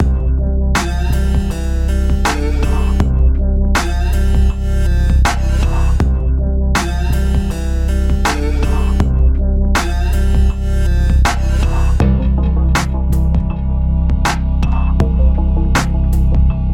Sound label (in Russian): downtempo muzika